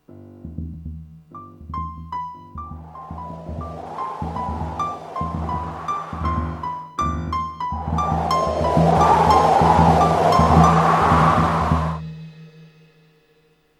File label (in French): Suspens